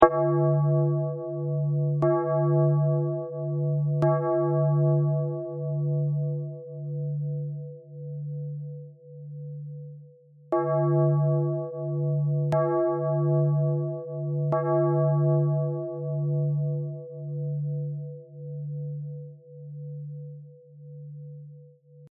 distant bell

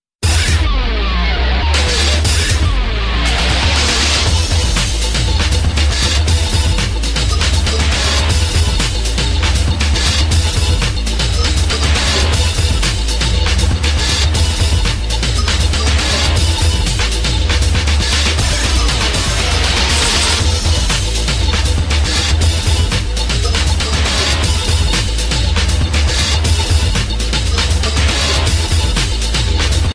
game character select

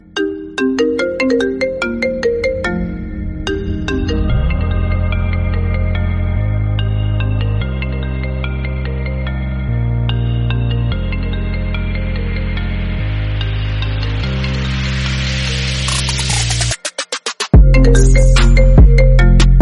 iphone 8 8 plus ring ringtone apple ringtones

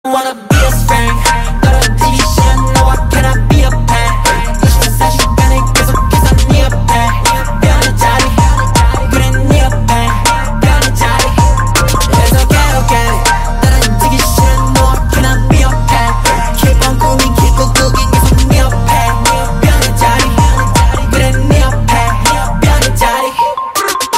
cat dog

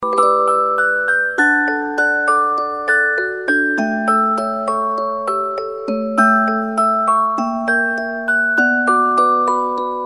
musicbox; totoro

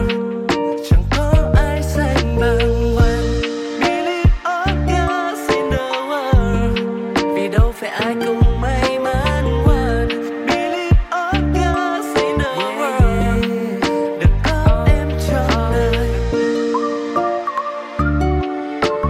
Lofi Ver

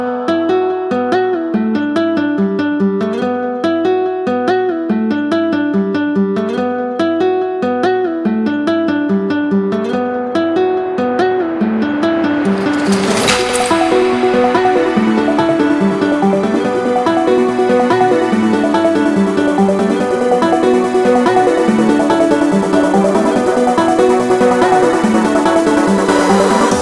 guitar sound

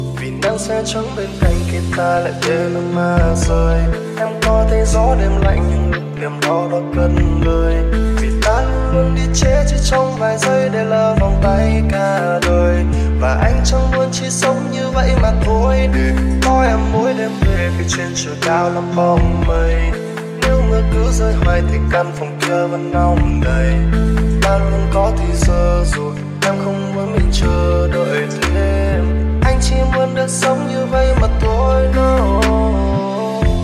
Lofi

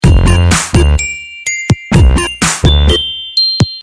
ice cream truck

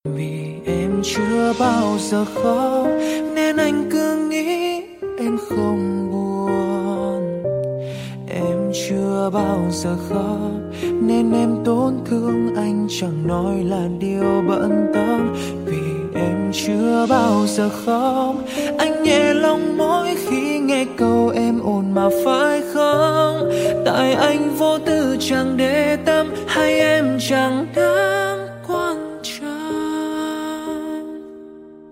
Piano Cover

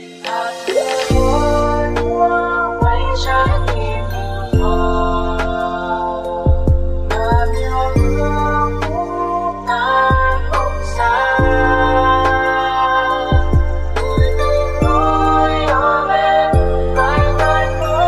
Lofi Version

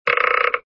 crunch

